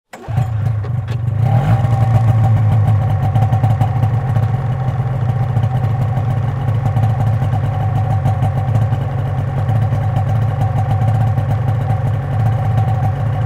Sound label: Snowmobile starts, waits, turns off